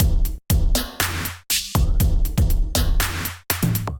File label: Kit, Drums Hybrid; Bang On Kit; Ableton; Factory Packs; Drum Essentials